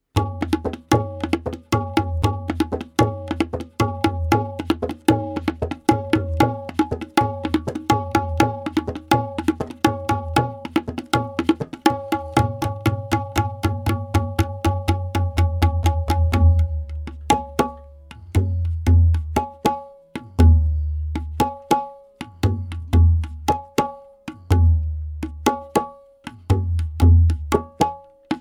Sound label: tabla